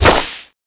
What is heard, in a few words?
dartgun; tfc